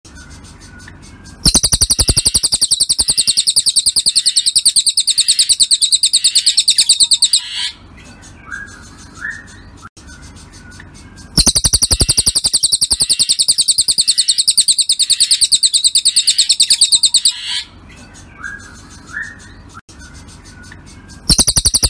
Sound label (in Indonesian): Kicauan Burung Cililin